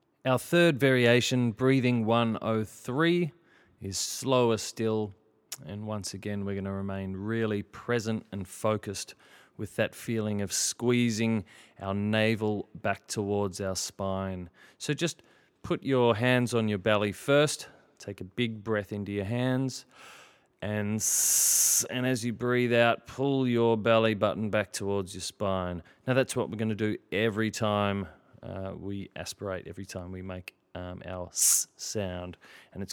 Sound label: breathing, singing